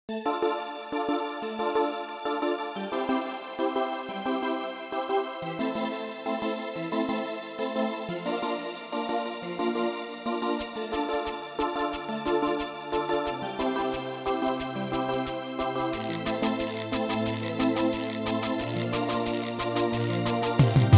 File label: Reggae Song